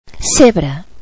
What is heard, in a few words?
zebra; animals